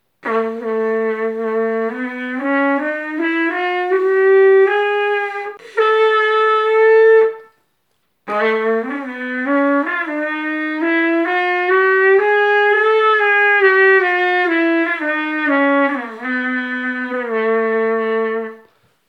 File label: transverse trumpet open closed notes